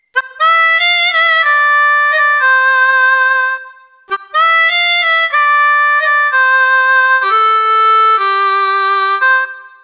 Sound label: Harmonica